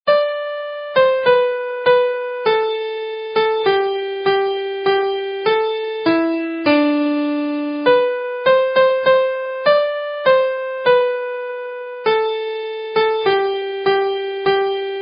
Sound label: Midi